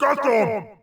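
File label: yell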